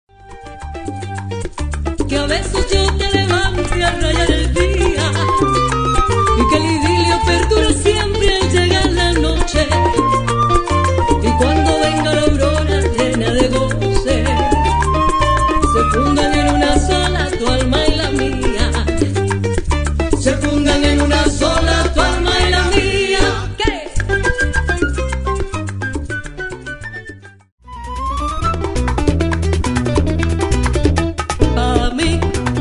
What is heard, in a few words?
Salsa